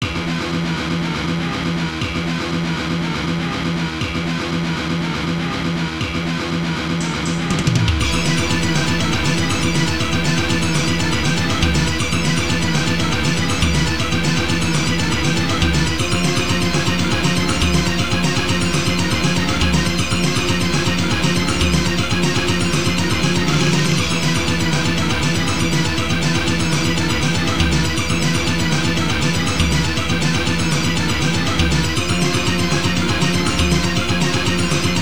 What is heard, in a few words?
Boss BGM; super spacefortress macross ii; arcade